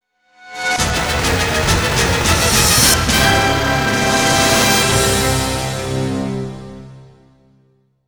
Failure; Chase the Express

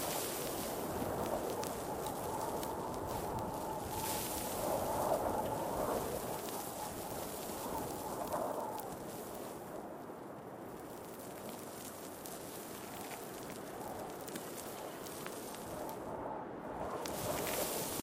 Desert Loop; doodle jump 2; android

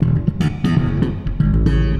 Bass wet; Nimbus Station; lego universe macos windows gamerip 2010

